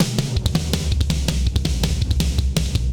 Fill Ambient Loud Punch 82bpm, Session Drums Studio; Tomzlla; Ableton; Factory Packs